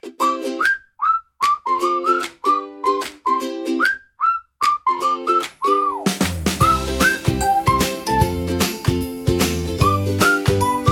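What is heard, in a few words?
rainbow steps a bright childrens flow; kids-and-fun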